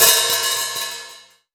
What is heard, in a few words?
dampened open high hat sample a sharp key